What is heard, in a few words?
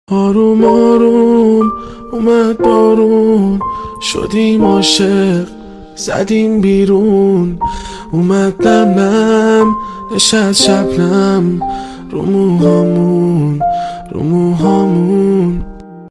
Guitar